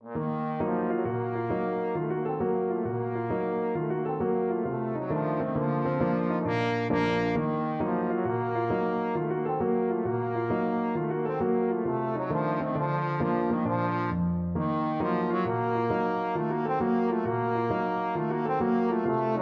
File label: kolo, trombone